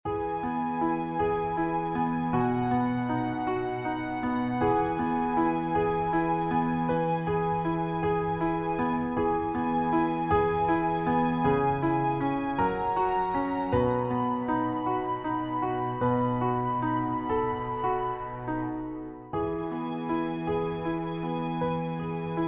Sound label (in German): E, Klavierversion